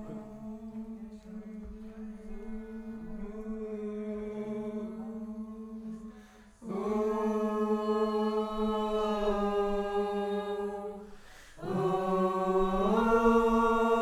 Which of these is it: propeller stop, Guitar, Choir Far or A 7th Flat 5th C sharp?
Choir Far